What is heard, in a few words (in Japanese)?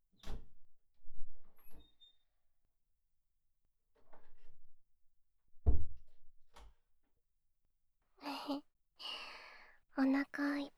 膝枕耳かき; 瑠美